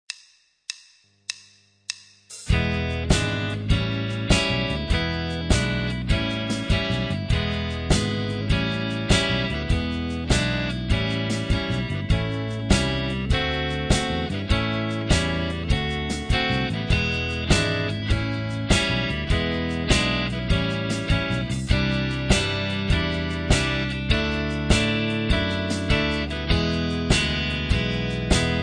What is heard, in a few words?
Playback, Rock & Blues Solos; De Haske